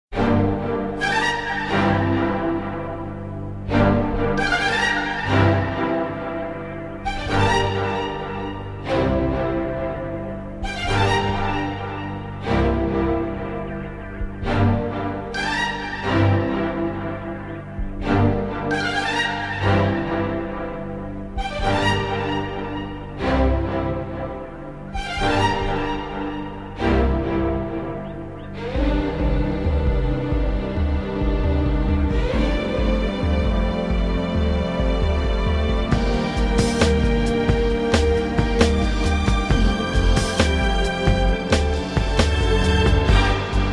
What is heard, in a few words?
Instrumental